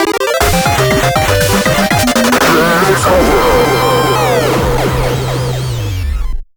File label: 8bit BGM